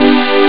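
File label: 1st inversion major; jazz jackrabbit 2; M8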